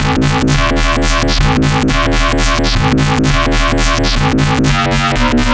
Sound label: Popwow Bass, Drum n Bass; KJ Sawka; Drum n Bass & Beyond